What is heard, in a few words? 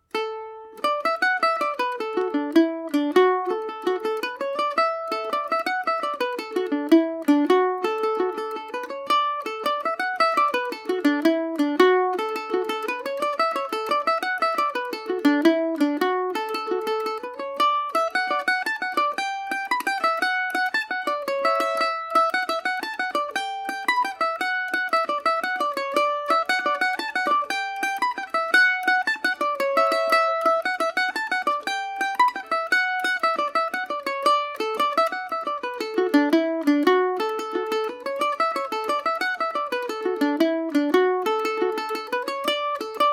frost is all over dmaj, mandolin